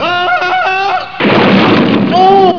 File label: yell